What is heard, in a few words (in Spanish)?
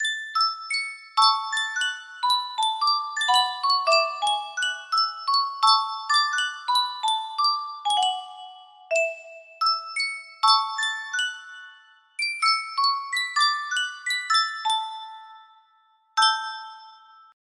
Yunsheng caja de musica, music box melody